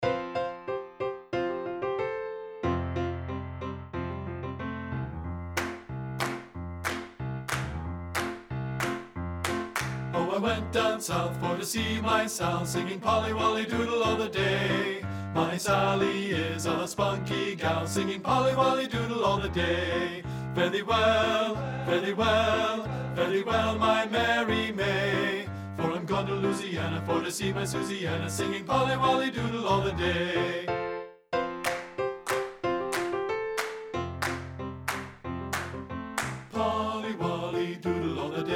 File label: BASS; TTB; men